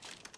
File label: Weapon Equip